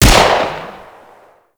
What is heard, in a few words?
Pistol Shot; Warframe; Weapons; Tenno; Cowgirl Pistol